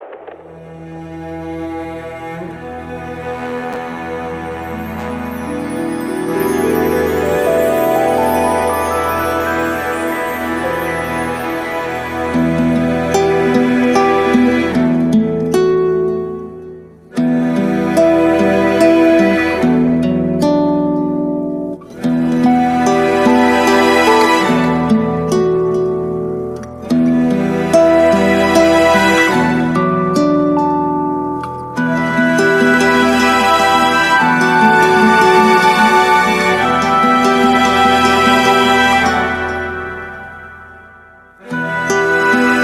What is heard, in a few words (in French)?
violon start